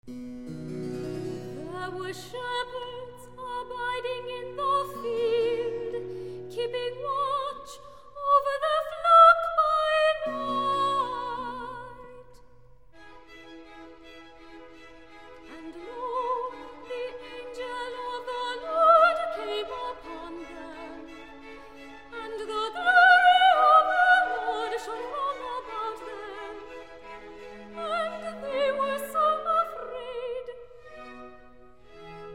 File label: There were shepherds - Soprano Recitative -- And lo, the angel of Lord came upon; Boston Baroque; Handel Messiah